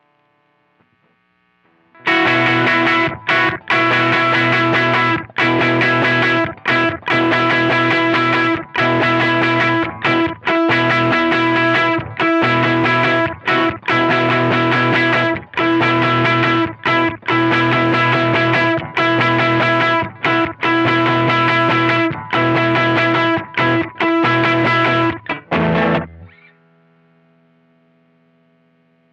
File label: Riff Slow